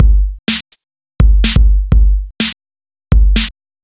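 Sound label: bass drum snare, Drum Loops 125bpm, Nu Dance Electro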